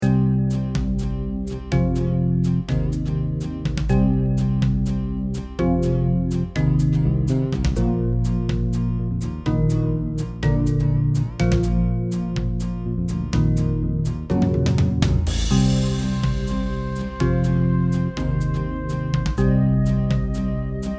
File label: loop